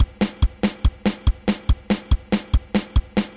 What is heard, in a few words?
e16 polka loop